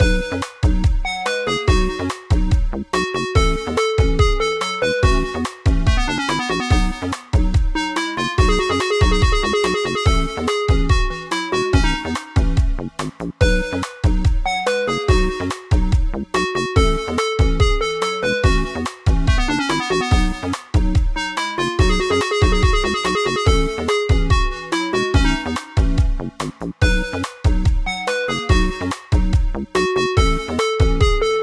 Continue

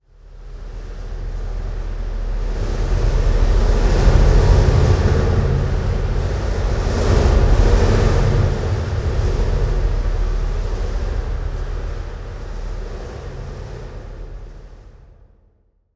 environment temple winds stinger indoors; Aragami

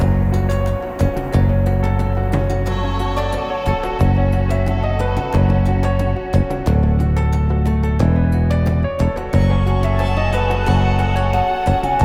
Swamp Loop

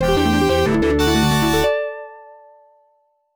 Wave Complete; Off the Wall

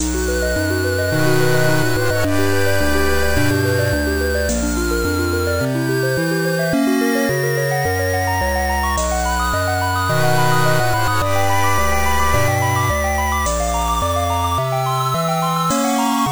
8 Bit; Labyrinth

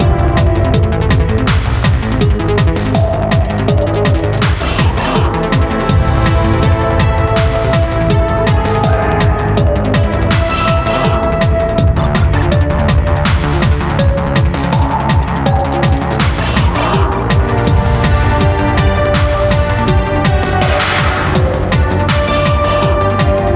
Interface Menu Screen Loop; Lastfight

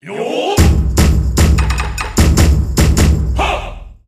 Level Up; Shiren the Wanderer The Tower of Fortune and the Dice of Fate; PS Vita

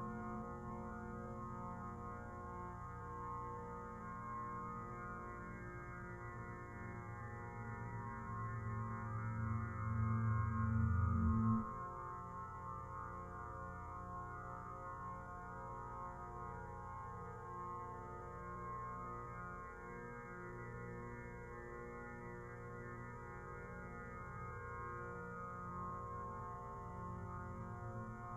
Ambience; Dt D Tertiary Ambience3

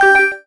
KEY Hold Finish

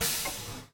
Door Pneuma Up Gas Release

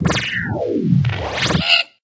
Sfx - Telebird Summon

